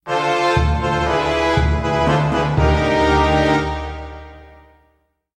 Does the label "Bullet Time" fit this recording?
No